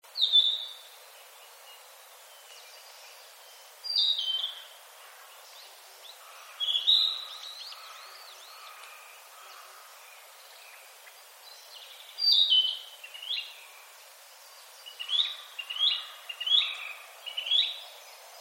kibita

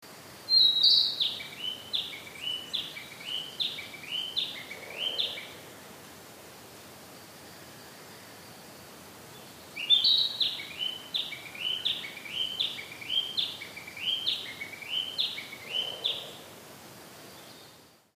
kibita

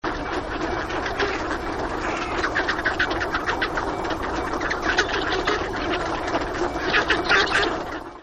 onagagamo

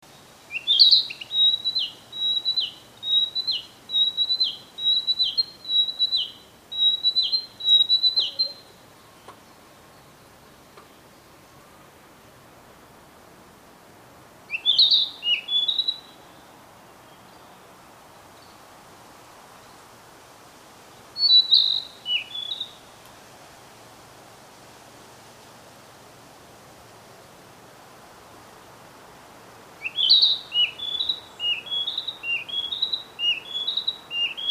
kibita

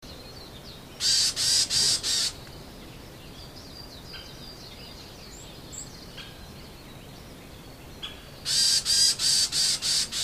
yamaga

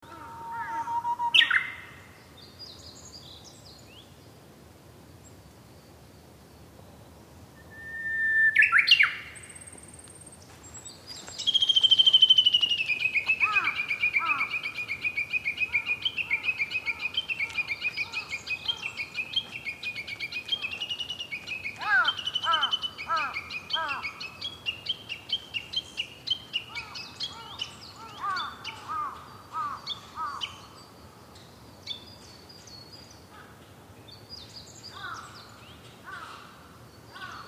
uguis

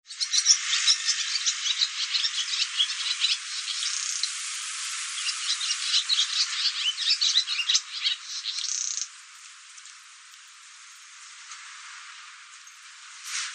tsubame

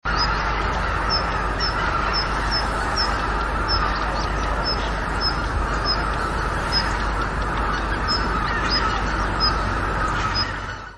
joubi

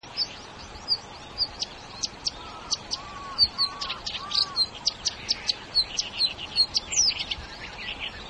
koyoshi

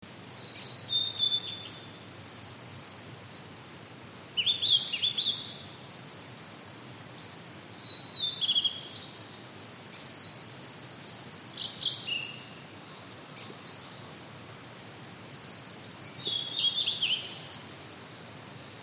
oruri

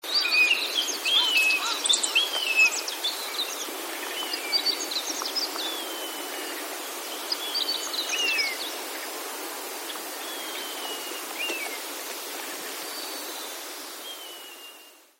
nosuri

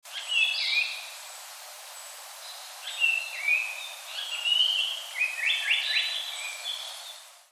sanko

